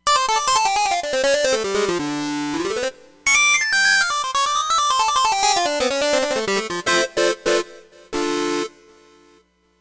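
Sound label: Destroyed Lead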